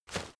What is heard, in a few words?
step leaves wet sprint single; schritte